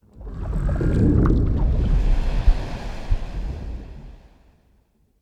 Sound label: aquatics portrait machine